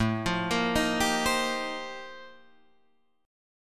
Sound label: F 11th A, chord